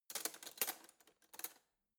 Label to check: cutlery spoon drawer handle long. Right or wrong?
Right